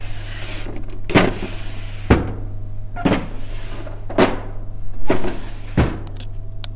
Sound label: chopper